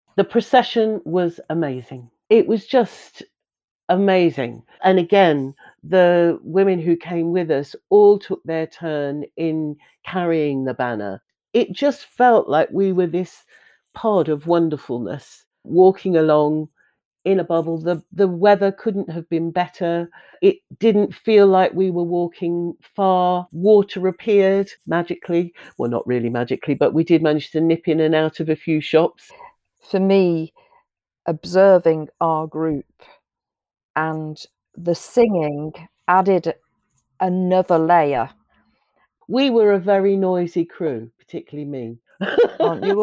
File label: The Procession singing